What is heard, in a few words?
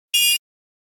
Alert Beep Sci-Fi; Hollywood Edge; sound designer's toolkit v2